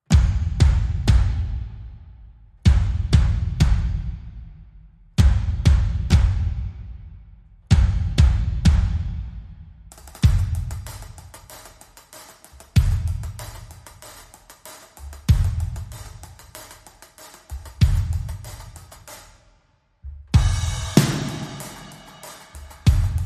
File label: Drum Kit Only